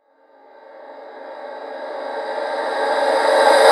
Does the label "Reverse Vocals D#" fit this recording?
Yes